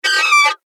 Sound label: creatures imaginative